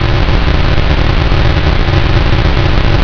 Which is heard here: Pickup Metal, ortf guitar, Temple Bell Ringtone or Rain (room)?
Rain (room)